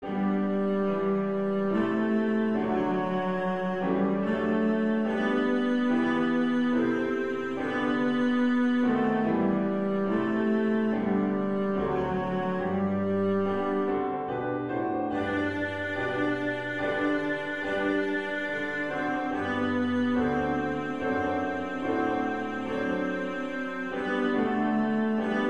hymne national anglais

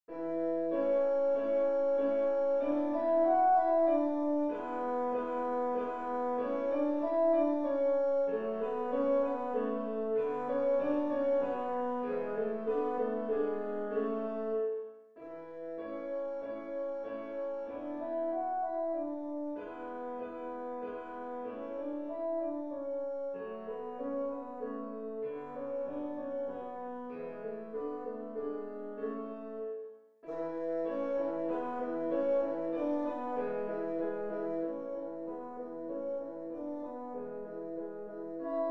contredanse majeur; beethoven ludwig van

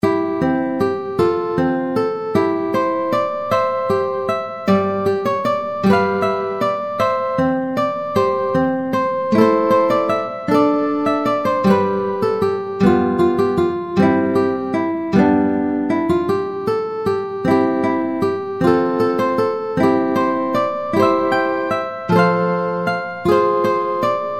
guitare solo

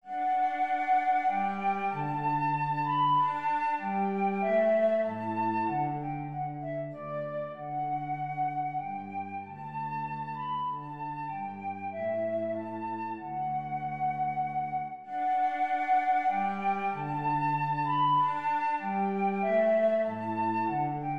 menuet majeur; lully jean baptiste

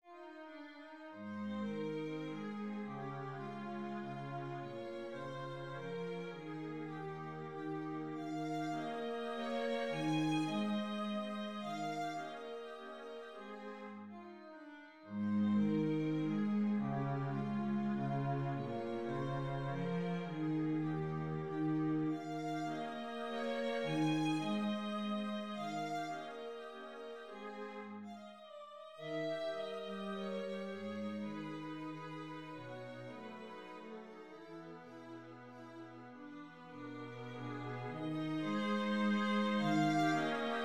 valse; beethoven ludwig van

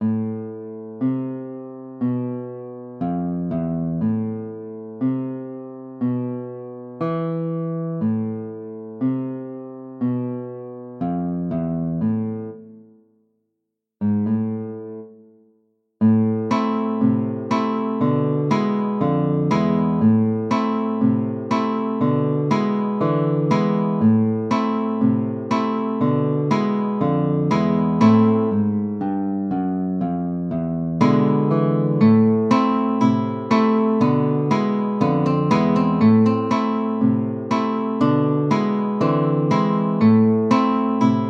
reggae; Caillet, David; ronfleur

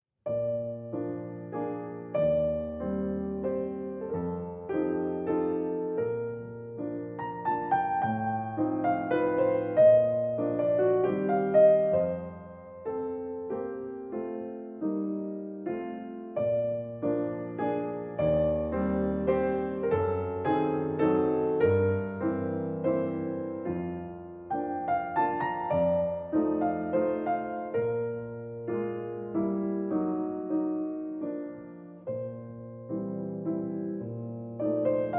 cantabile majeur; chopin

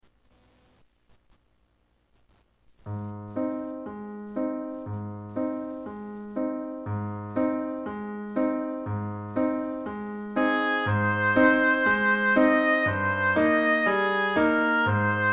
chanson metronome

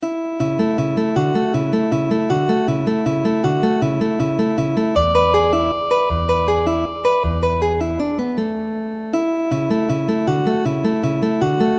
valses; legnani luigi

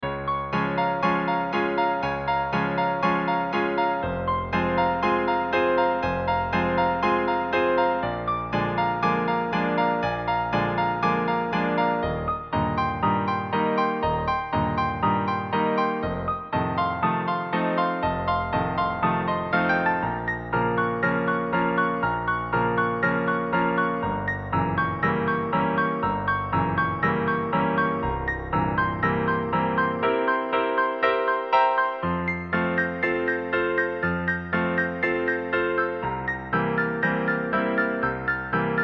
etude de doubles notes

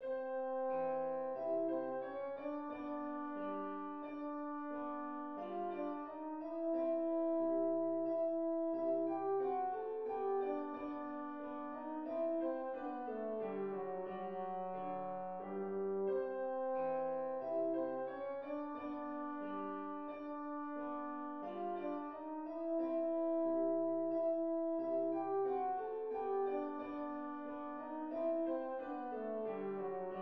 menuet majeur; mozart wolfgang amadeus

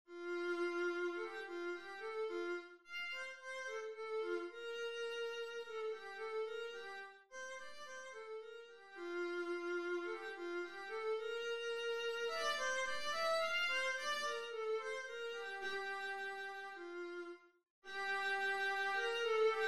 sonatine; beethoven ludwig van

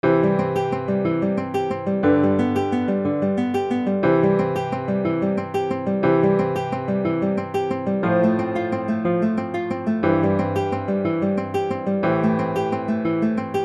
swing

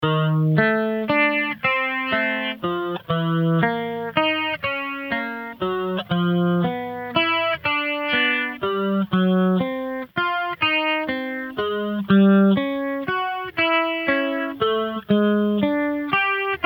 rechauffement pour guitare

